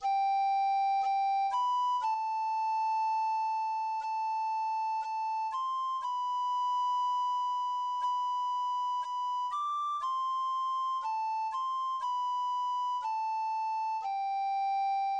recorder

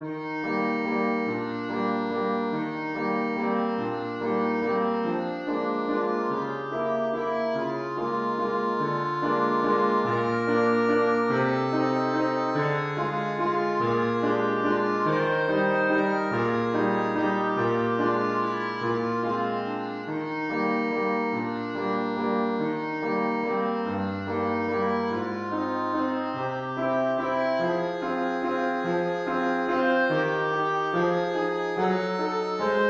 clarinet

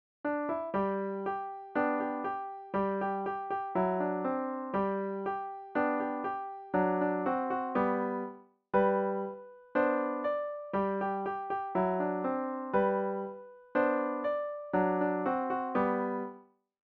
pick a bale of cotton piano

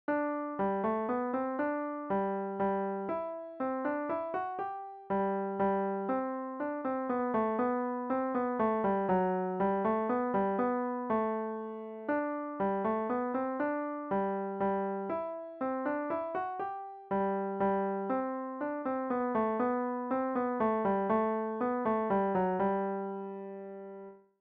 minuet in g

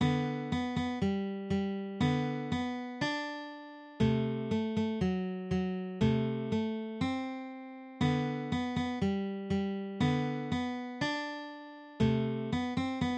skip to my lou guitar

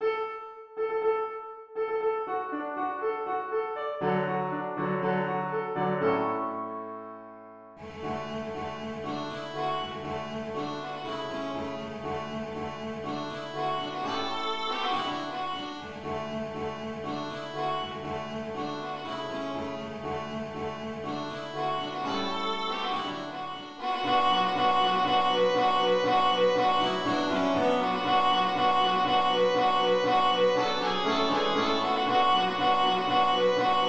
violin

